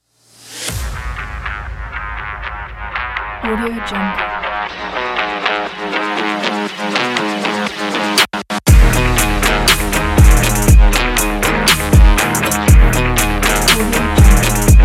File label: action sport hiphop